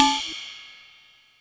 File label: ride, Yamaha DTXpress Effect; Effect 1